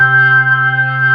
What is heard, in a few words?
org C3, Organ B3 Hammond